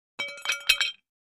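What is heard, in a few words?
Large Tile Square Pieces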